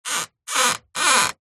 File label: household window thin garage sliding slight squeaky movements